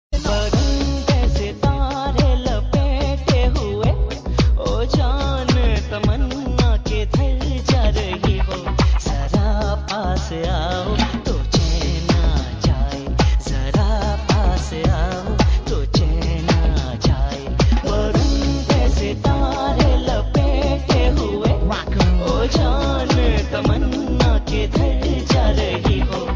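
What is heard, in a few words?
Remix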